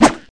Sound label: sword miss; cstrike